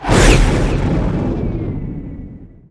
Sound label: warp exit; Earth and Beyond; EA Games